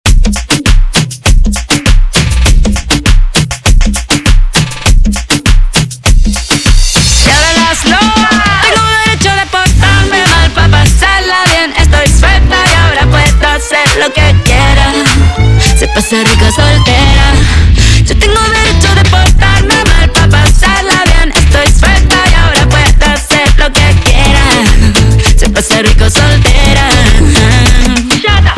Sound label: Intro Outro 100 BPM